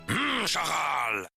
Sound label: hmmm